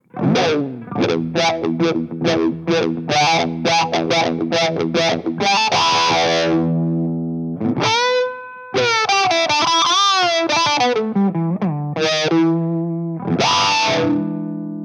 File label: Drive Range